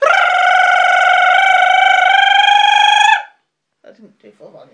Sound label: does her mating call